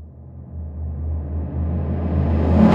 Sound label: Reverse Hit, impact drum hits; Sonic Foundry (Sony Creative Software); The Electronic Music Manuscript Collection